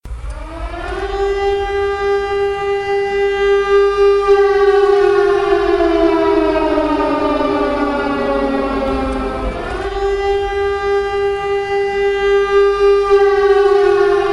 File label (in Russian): zvuki sirena grazhdanskoy oborony